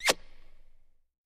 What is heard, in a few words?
Kiss Echo; kissing